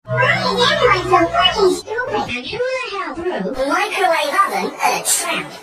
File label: Funny Ringtone